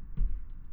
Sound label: footstep upstairs; Project Zomboid v41.73